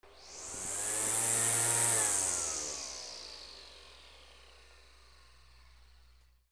GARAGE

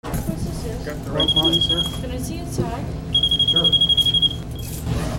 AMBIENCE

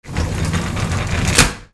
HOUSE

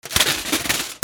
RIPPING

